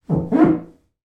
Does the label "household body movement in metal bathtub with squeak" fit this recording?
Yes